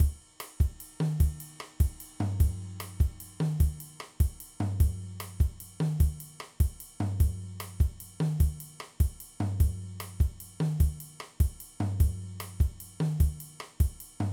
Afro Cuban

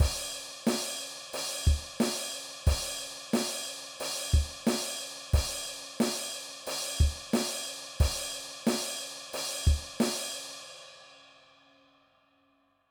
Rock Drum Beat

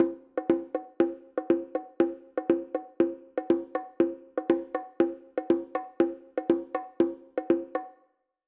Bongo Pattern

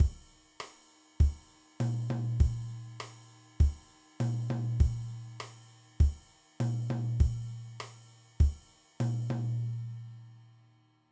Mambo Drum Beat

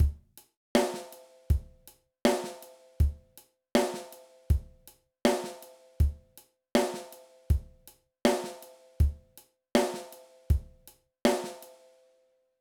Ghost Notes Exercise, drum

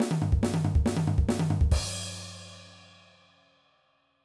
Punk Fill, drum

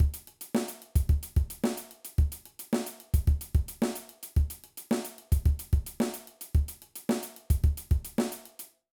Punk Beat

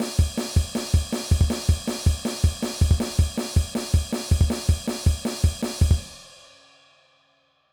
Punk Drum Beat

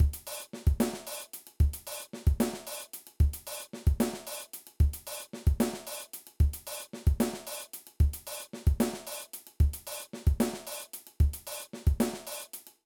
Shuffle Groove, drum

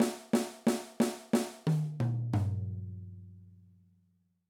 Eighth Note Example, drum

